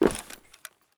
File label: tin, material actor step; tin4; Soundscape Overhaul